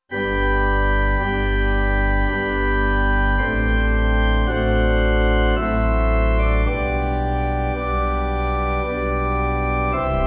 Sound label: Largo, Pipe Organ; Xerxes - BWV 40; G.F. Handel